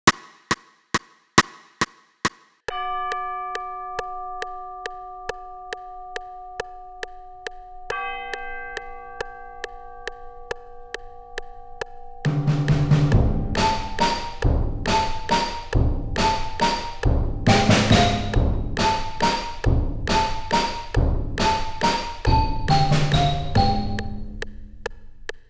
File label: with click